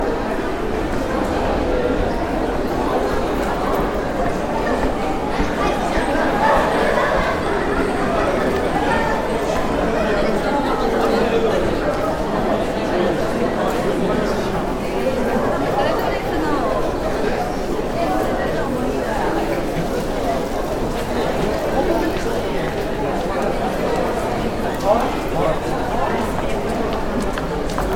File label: gym